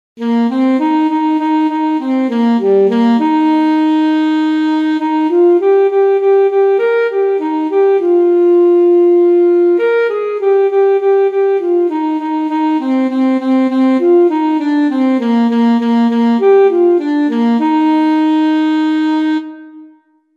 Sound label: alto saxophone; she'll be coming round the mountain